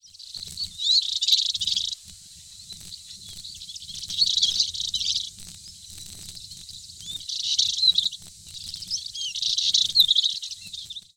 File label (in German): provencegrasmuecke